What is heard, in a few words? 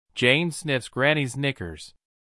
sniffs